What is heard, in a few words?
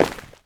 dirt land; Golden Crayon Sounds